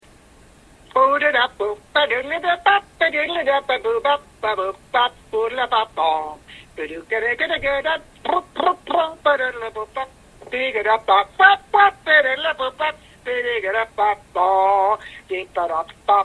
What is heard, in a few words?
Car Singing